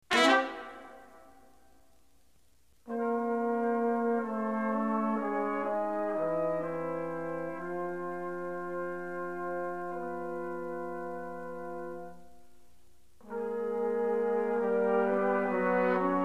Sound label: Brass Quintet Dolente; Brass Quintet II Dolente